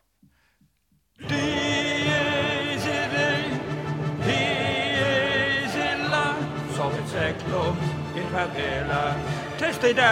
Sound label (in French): Basse Dies Irae; Mozart Requiem